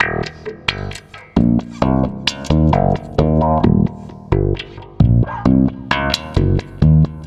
Stick Loop, Stick Bass

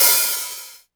HH NRM 2 O, Korg EMX-1, drum machines

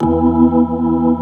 E2, Keyboards Old School; USB Soundscan vol.09; AKAI; BIG HAMM

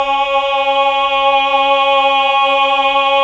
TENORS; Sound & Vision - Gigapack II CD 1 (Roland)

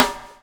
BUBL, BUBBLE; Spectrasonics - Backbeat

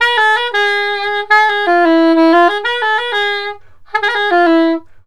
SAX, Balkanish Traditions

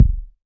BD, Waldorf Blofeld

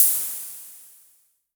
Hi Hats Open; LIMAT Div Dept

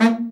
BRA Bari, SAX; Best Service Gigapack 1; BR SAX 2

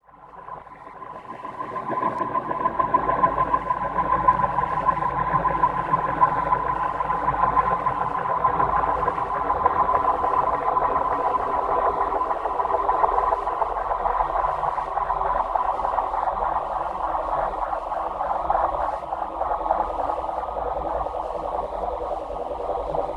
FLOATING SPACE FUZZ, DEEP ATMOSPHERICS; Sonic Foundry (Sony Creative Software); Vir Unis Infusion

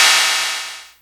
perc; Stereo

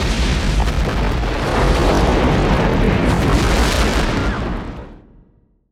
EXPLO; Best Service; Advanced Mega Effects

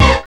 DISCO FX; Zero-G; Funky Elements

